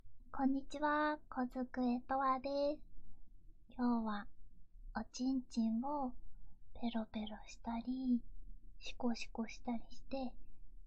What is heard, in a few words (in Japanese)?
ゲップ, 喉奥フェラでゲップ出ちゃう; ゲップで興奮する変態ちんぽ手コキ＆フェラ